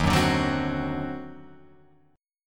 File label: Eb Minor Major 13th, Guitar, Open F; EbmM13; strummed; JGuitar